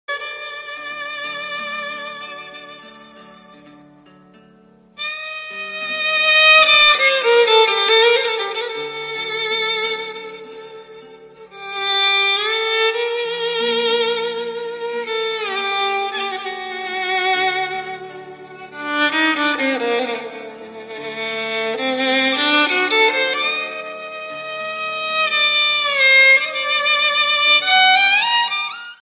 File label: sad ringtone